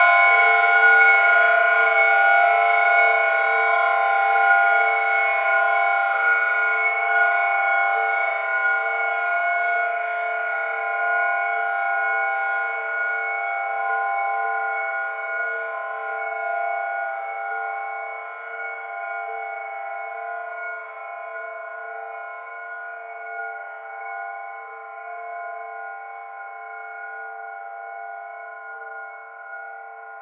Terrifying Chimes; SFX; 7rystan's 700+ FREE COLOSSAL EDM SAMPLE PACK

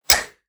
snare; base pack; drums

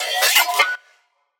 Synth

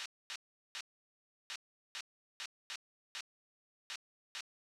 perc loop 100 bpm; drums; loops; bespoke - Floral v.2

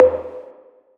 Wood hit reverb; SFX; One shot; 7rystan's 600+ FREE EDM SAMPLES & DRUMS

